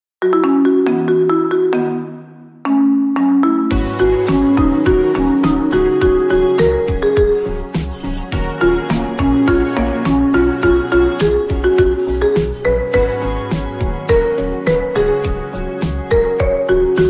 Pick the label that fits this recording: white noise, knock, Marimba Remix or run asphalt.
Marimba Remix